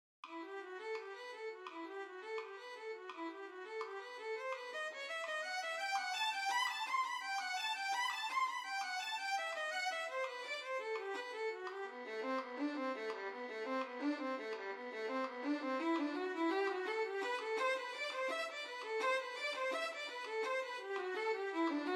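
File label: Violin, Orchestra; Kayser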